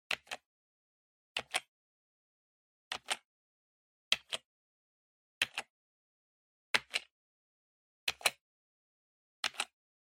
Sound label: PC Keyboard Single Gentle